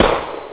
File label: pistol